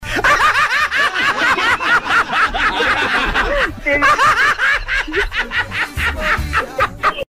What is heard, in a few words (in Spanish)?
risa